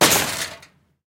fence metal small fall; tanks; War Thunder

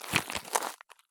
turn gravel; Escape from Tarkov

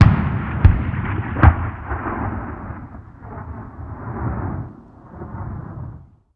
artillery med; ModernWarfare

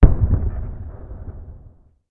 Amb Destruction

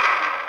minigun spindown; Modern Warfare

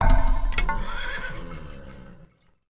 Build Mogol Keshik; Civ4 warlords

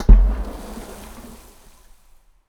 harbor occasional

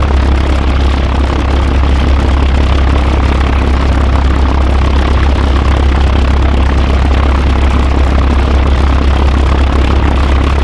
Aircrafts Engines DB605; DCS

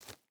footstep burlap dirt jump start; Rust

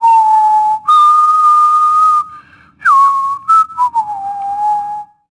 whistling

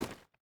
footstep boots stones run; Rust

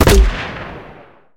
grenade launcher; cannons; War Thunder

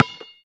basketball dribble; Modern Warfare

